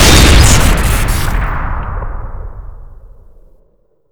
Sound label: cannon fire crit; weapons; manncannon; tf2classic